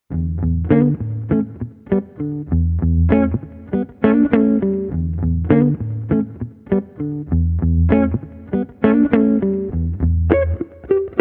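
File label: Street Guitar Bass Laidback Groove, 100 bpm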